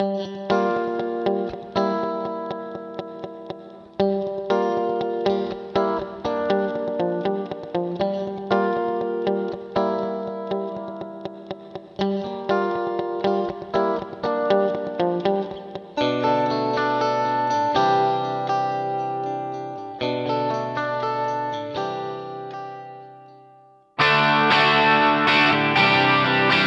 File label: Guitar Yamaha Rev7 Vocal Plate; Vintage Digital